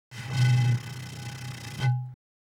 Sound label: bottle, ethno, 125